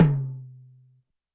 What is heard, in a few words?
Tom Lo-Fi, YAMAHA RM1X